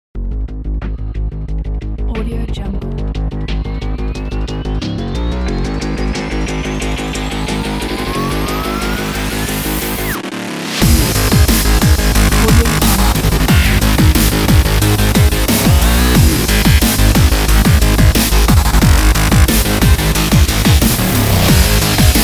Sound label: dubstep intro